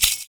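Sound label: PERC, percussions; Univers Sons - Raricussions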